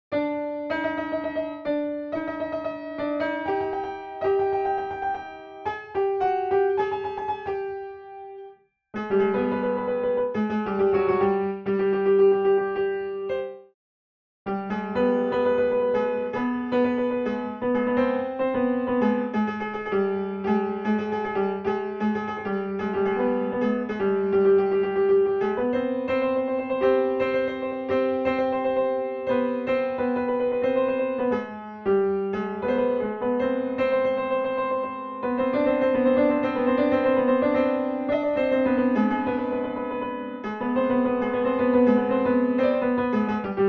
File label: Daramad Chahargah, piano; Radif Mahjoubi